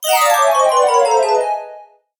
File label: Fairytale game over; ao thuat